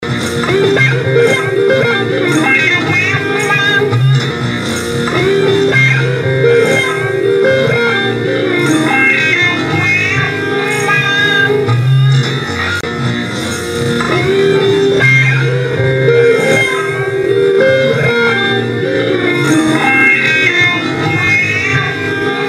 solo

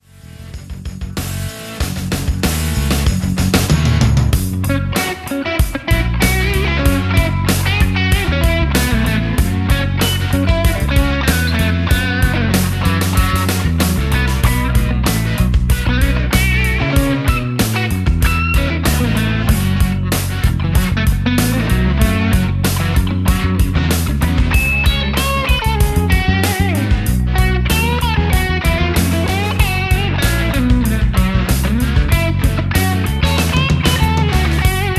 Jam

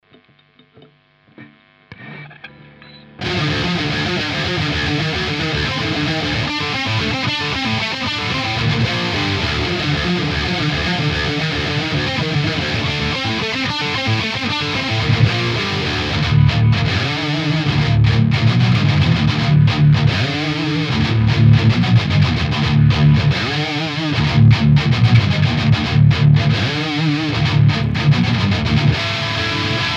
ch2 modern, mesa boogie dual rectifier

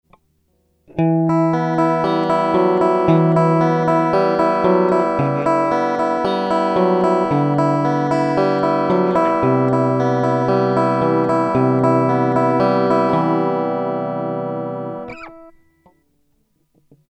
pu mid back, strat; harley benton

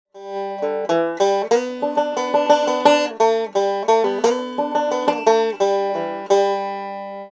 md441 banjo

